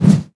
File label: bigaxe